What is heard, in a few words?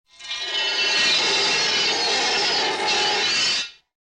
metal, gnissel